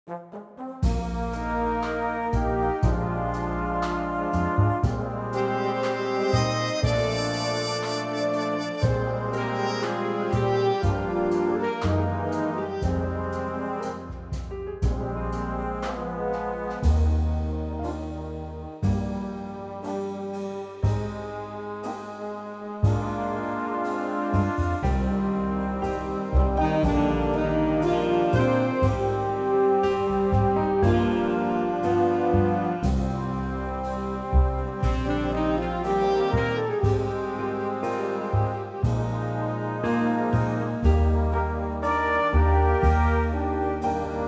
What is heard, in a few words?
Big Band Eb